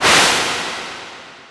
whoosh; anvil

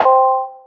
unlock; vellum

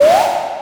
ui open; prism

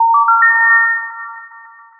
powerup, game sfx; prism

